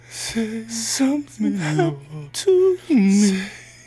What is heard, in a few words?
mixture, singing; MedleyVox; singing librispeech